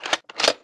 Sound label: Gun Reload Weapon, Weapons Foley; SFX Kit